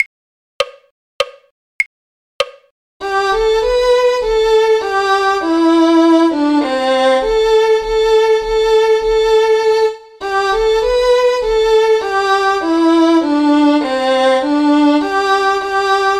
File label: violin; carols